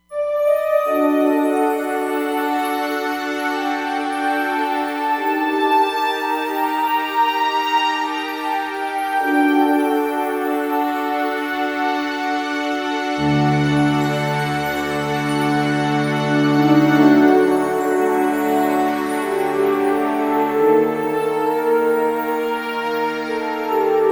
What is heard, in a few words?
Mystic Str, synth